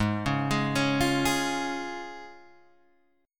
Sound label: F Minor Sharp 5th, chord